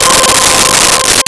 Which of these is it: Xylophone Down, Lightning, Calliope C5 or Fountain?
Calliope C5